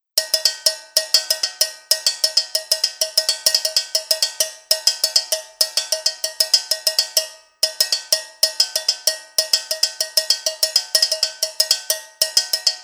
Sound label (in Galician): tixola